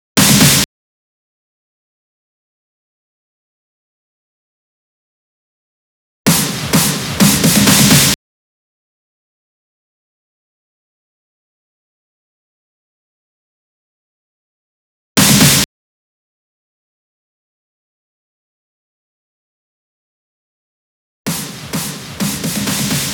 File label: 128 BPM Fill Ins, Drums & Perc; Vengeance - Essential Clubsounds Vol.5; Sidewalk Kit; Root G